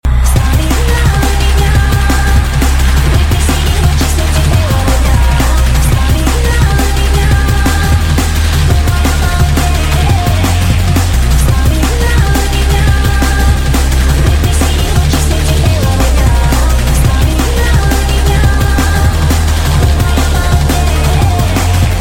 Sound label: Nice Drum